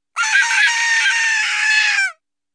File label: mole screaming